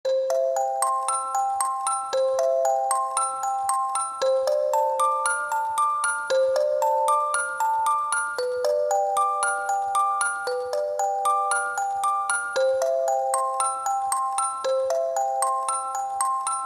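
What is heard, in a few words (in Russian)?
ksilofon, xylophone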